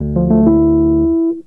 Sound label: Rhodes, Keyboards; Total Drum&Bass